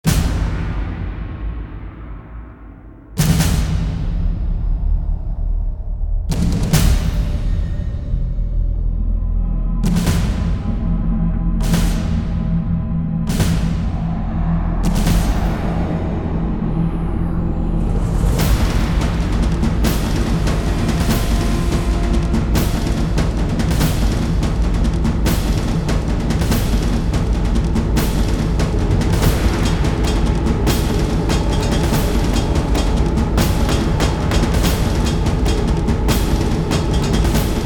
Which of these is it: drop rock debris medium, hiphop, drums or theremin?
drums